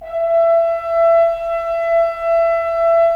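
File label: Solo French Horn, brass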